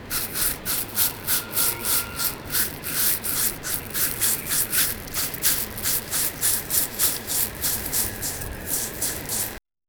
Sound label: delicate brush strokes, cooking